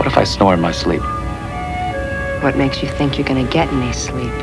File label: snore; Snore In My Sleep